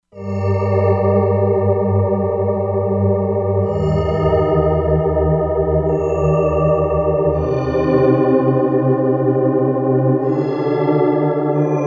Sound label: Spectrum, Synth